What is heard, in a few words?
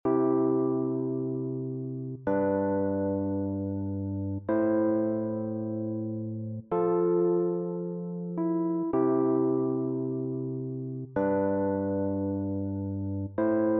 Electric Piano; MASCE Tryout track; MMEA All-State Collective